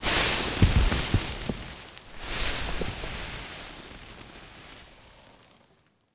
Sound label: combat atmosphere; World of Tanks